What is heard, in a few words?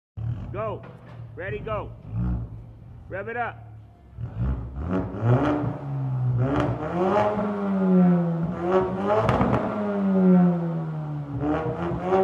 coyote ecoboost, Mustang